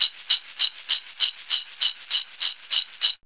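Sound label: SHAKER RHYTHM; DRUMS & PERC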